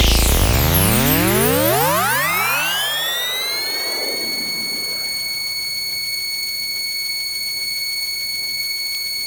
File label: GAME TOUCH HOLD GOOD; maimai